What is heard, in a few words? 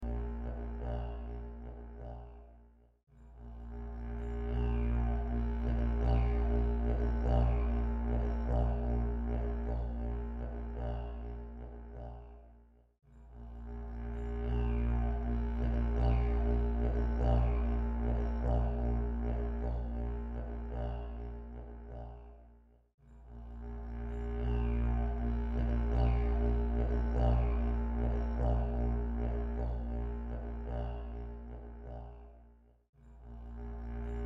Didge Fade In Out